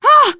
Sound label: hurt major, teen girl; player; survivor; voice